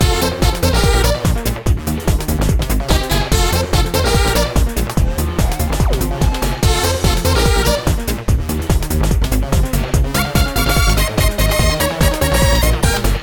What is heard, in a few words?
Endgame Loop; Sugar Rush Speedway Online